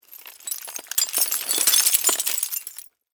Crash Glass Debris, Crash, Glass, Debris, Movement; Materials; Blast Wave